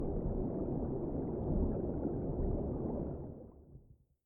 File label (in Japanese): Mizu no naka